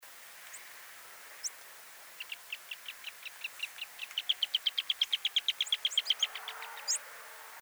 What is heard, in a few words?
White bellied cinclodes; پرنده آب جوی شکم سفید